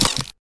Spider Hit; Lethal Company; Windows

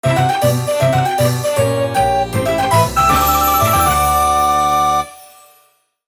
Opening Jingle; Peach's Birthday Cake

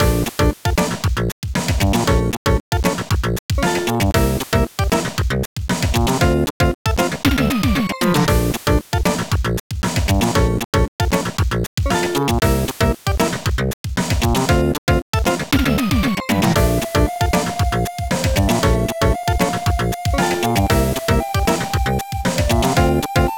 House; superlite 2500 custom mahjong

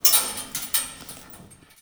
CHAIN Remove; don t be afraid the first toy

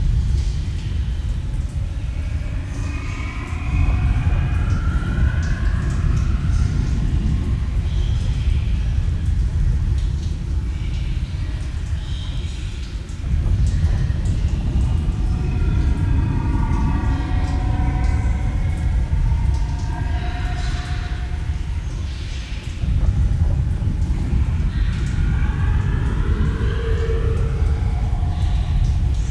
Ambient Cellar; Lucius

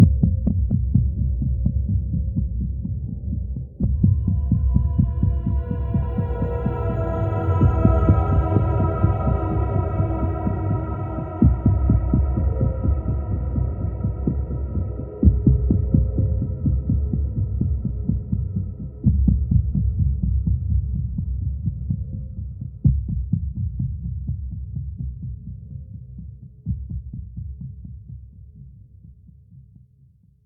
Sneak

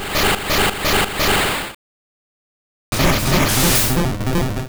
Razor Leaf; Pokemon; Gen 1; attack moves; RBY

